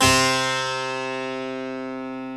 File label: Harpsichord; E-MU Producer Series Vol. 2 – More Studio Essentials; Keyboards